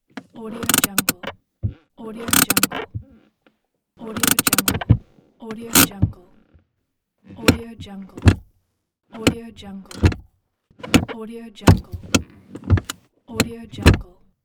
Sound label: car handbrake pack